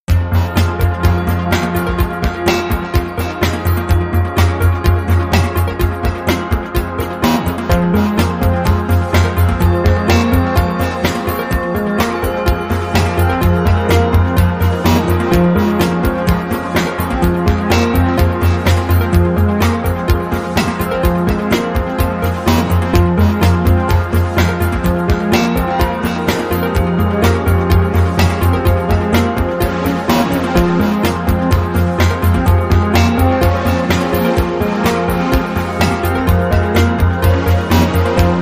Haunting Hunt

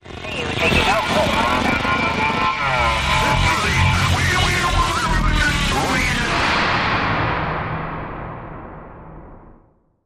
Distress Call

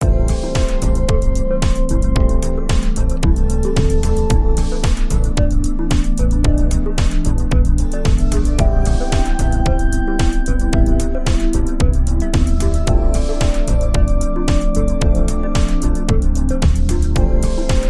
Trance

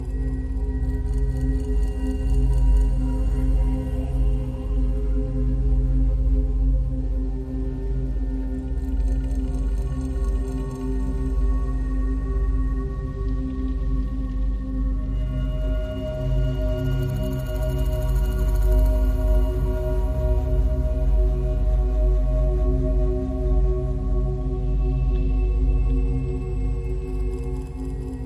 No Piano

